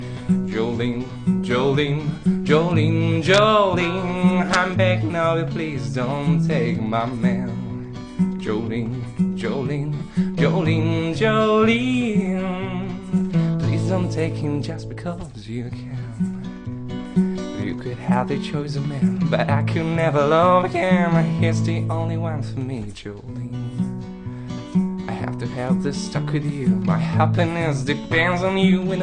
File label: Speed 33rpm